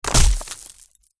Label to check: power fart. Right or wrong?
Wrong